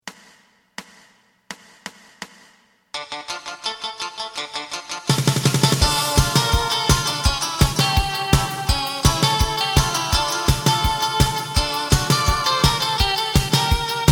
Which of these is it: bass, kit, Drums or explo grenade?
bass